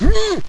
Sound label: player pain; firearms